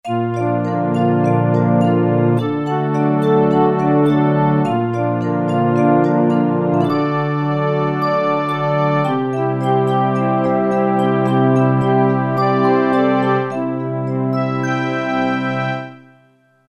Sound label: pad; pad - Guidance; E-mu Proteus FX